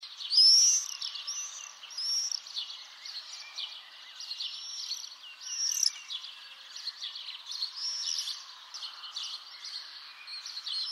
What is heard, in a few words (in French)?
Martinet noir, chants oiseaux